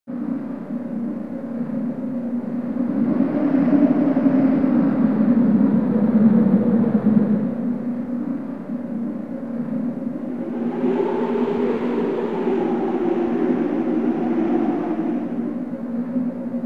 Old city ambience; Mega Man 64

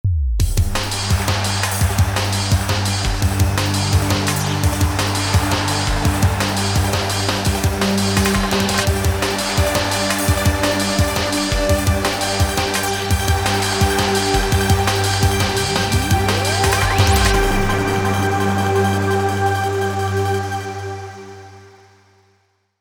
In Game Start Signal; Street Gears

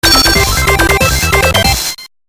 Victory!; Rave Master Special Attack Force; GBA

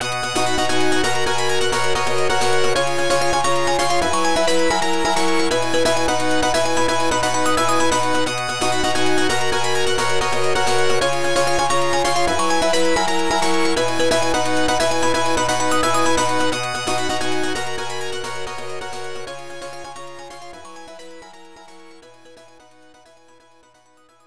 Player Win; super volleyball; arcade gamerip 1989

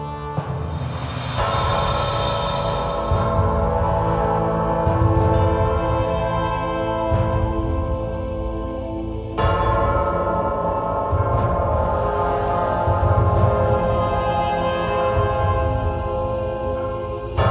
Menu Music; RLK Adv

